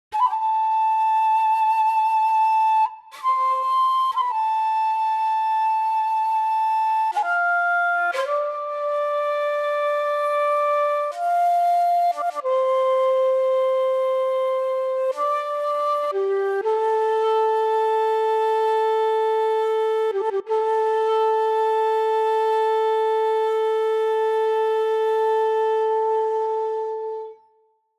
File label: Shakuhachi solo